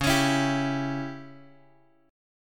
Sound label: C sharp Diminished C sharp; C#dim Chord {X 4 X 6 5 3} strummed